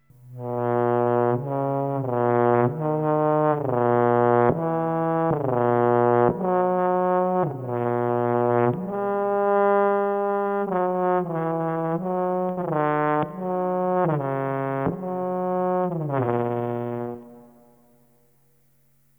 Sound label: brass Grave; ModelesPhysiques